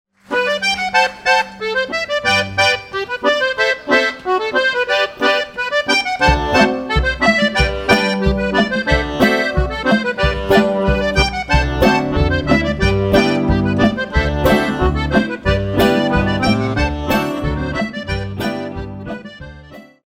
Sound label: Cumbia